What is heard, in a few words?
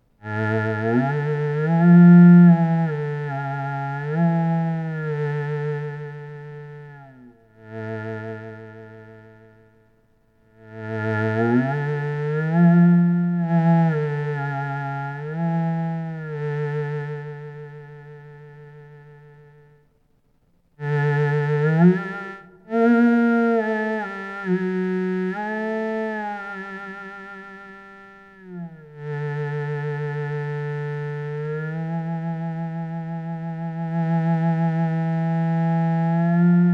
sunrise cello